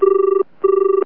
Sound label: PHONE; ANIMAL; HITS